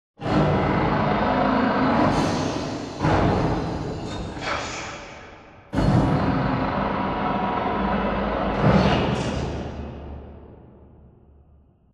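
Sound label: Assembly Wall Arm Distant; Titanfall 2; Boomtown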